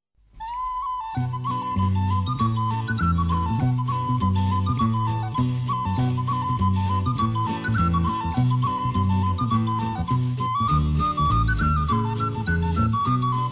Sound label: Musical Priest reel, Irish